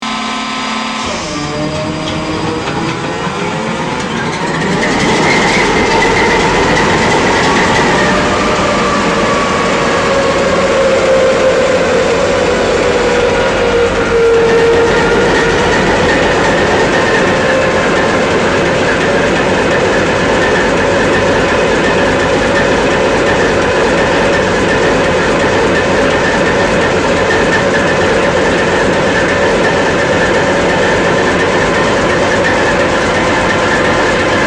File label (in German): Motorstart Abfahrt